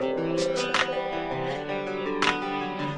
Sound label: 081 chingy guitar plucker; SAMPLED MUSIC LOOPS